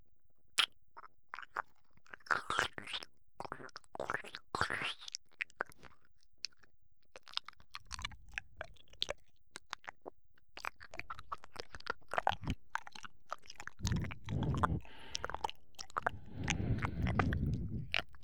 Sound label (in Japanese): W耳舐めループ; 低音版